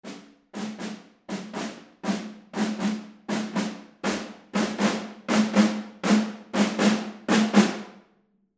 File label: Snare Ensemble; Cerberus